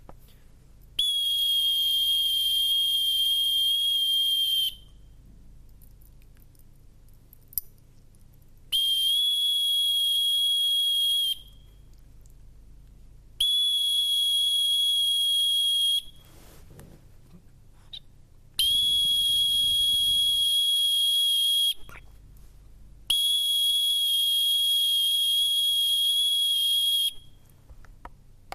whistle

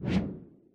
swing; operation

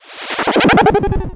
fly a spaceship

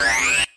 beam

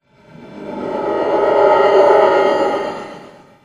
magic

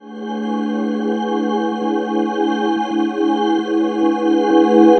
shine

